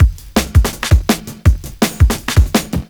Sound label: 165, Drum Loops; NoHangups; M8; Electronisounds